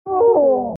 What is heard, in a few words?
Vox Filtered Hurt; The Binding of Isaac; Mom